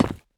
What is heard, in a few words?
footsteps tile